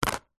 money coins x2 australian 2 dollar coins set down on plastic lid; foley; zapsplat